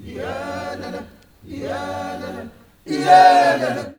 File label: U GELAN, Heart of Africa, CHOIRS; Spectrasonics